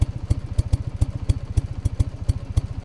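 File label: lawnmower idle exhaust; Vehicles; Basic Engine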